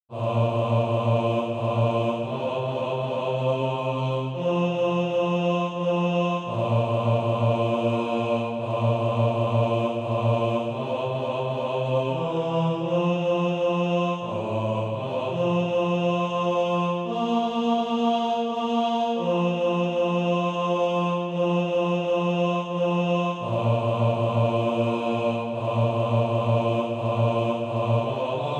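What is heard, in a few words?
bass, Practise Music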